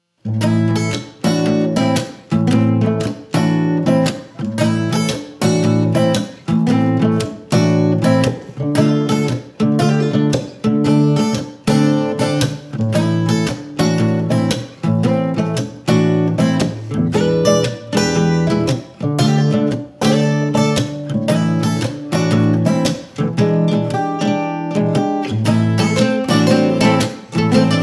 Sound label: Acoustic Blues Trio